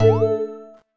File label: CURSOR